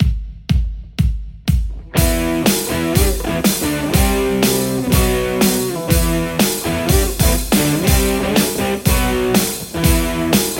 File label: les paul; ronald revolutionz